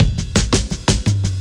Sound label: Beat Style, 170bpm, breaks